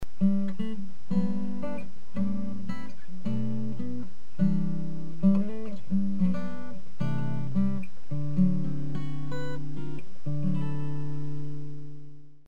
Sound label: guitar